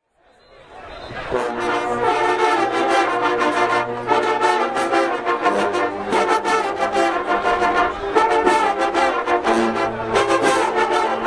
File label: southern university marching band